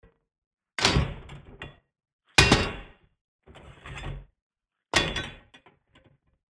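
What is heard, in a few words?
metallic mats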